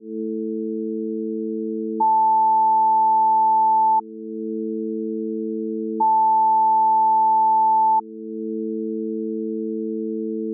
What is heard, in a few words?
with ducking, music